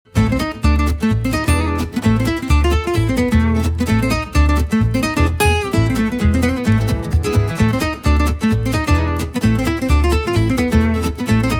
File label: Balkan Jive; Foximusic